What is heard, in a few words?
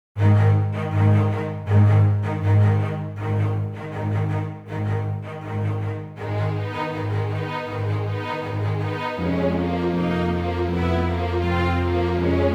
Medium Action